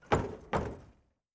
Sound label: Barn Door Pounding Cu